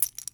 Key Grab

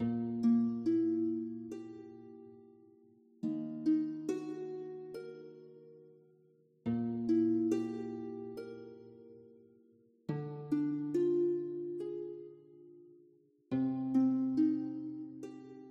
Harp; tintin reporter cigars of the pharaoh

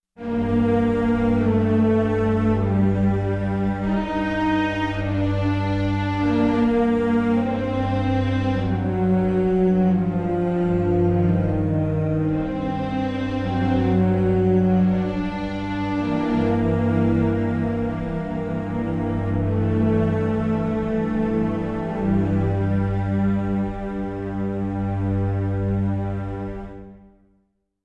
Tension Strings; Mob of The Dead

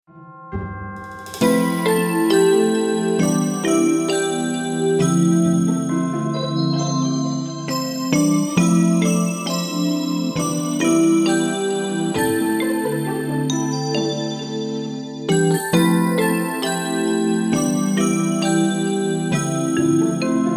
Log-in

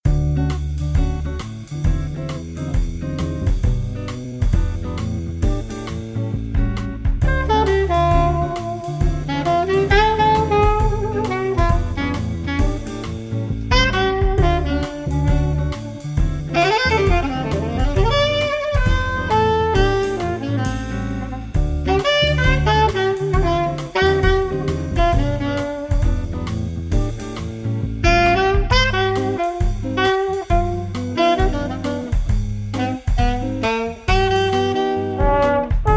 JAzz; Papa JAzz2; Paparazzi

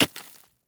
ice hit

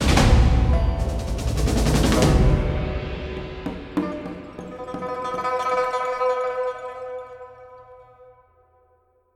Combat stinger end; Shadowrun Hong Kong Extended Edition

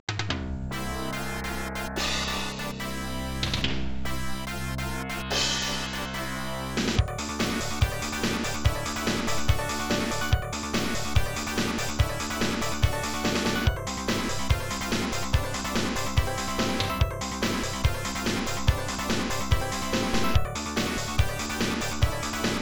Match Win; Bakuretsu Aniki; X68000

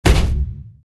Hit

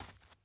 dirt step; teardown; ps5 windows xbox series xs

